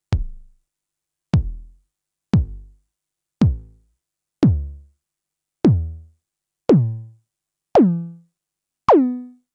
Roland TR-909 BD Mod Pitch; Synths

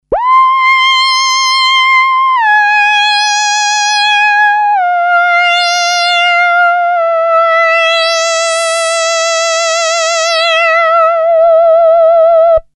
Korg M-500SP Synthe Traveler Mod Porta; Synths

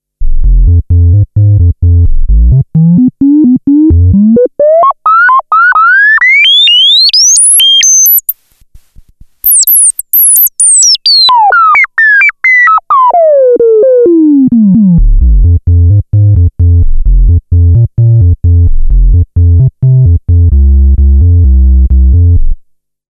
SH-09 VCF Reso Hi, Roland SH-09 Synths

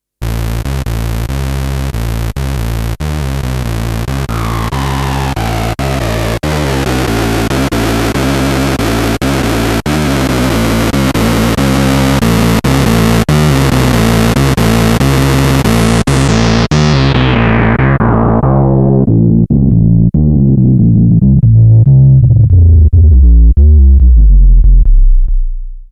Roland SH-09 Feedback

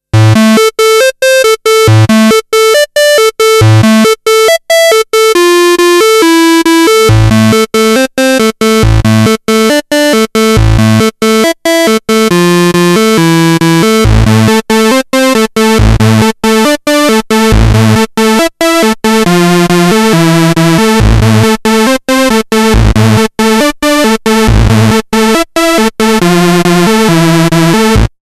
PWM, Roland SH-09

